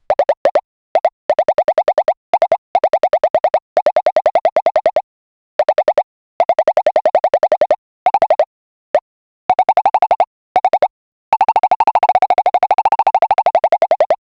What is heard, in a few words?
retro cartoon shooting so, arcade